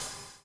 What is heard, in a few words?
BUBL, BUBBLE; Spectrasonics - Backbeat